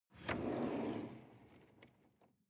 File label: drawer medium open draw